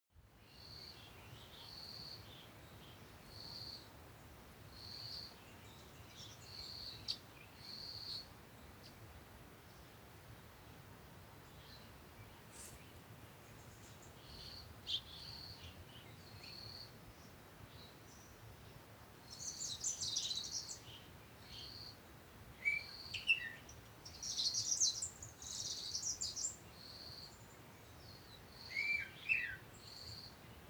african bush morning

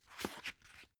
dvd case put in booklet

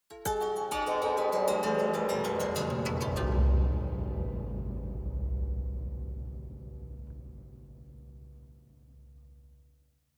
haunted strings down stroke slow soft

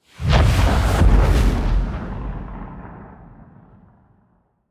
missile medium fire stationary distant

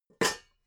pots stack

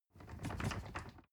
ladder wood rattling

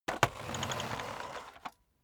vacuum sweep forward long

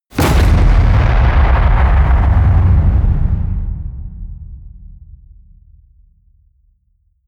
distorted explosive

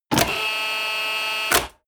fire truck ladder extend

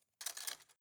cutlery plastic drawer place soft

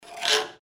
scratching

